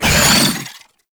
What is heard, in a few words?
Entangle Cast Vocal; Warframe; Oraxia